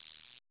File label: shakker